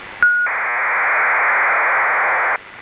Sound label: HFDL 1200bps